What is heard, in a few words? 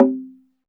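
SLIT DRUM C4 P; SLIT DRM1; Heart of Africa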